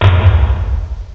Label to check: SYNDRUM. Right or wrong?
Right